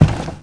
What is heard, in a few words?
FOOT WD, FOOTSTEP; Messiah